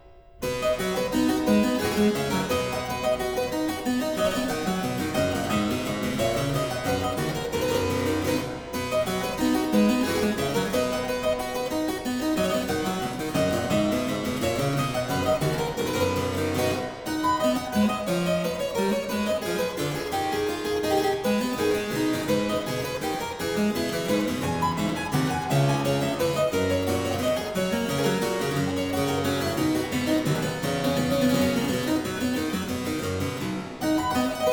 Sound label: Aria Variations in C Major, Harpsichord